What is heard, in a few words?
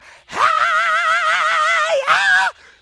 heeeey yeah